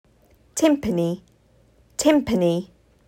Timpani

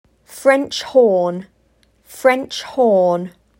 French horn

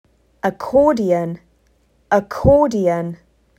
Accordion

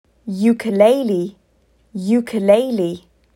Ukelele

